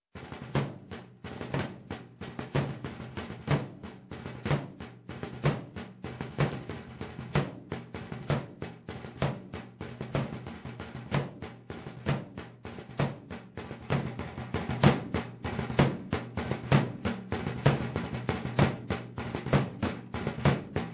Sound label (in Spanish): imagenes, tambores; Las imágenes 1; SAMPER